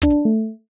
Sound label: wrong answer dry